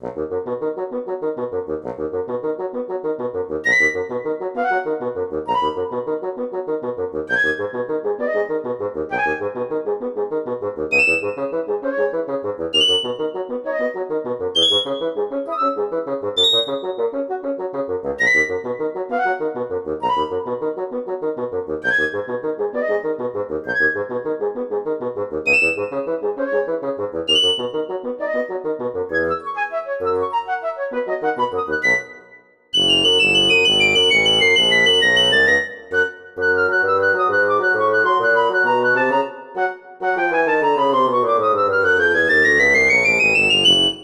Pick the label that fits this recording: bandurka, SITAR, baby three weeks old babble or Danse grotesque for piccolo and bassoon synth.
Danse grotesque for piccolo and bassoon synth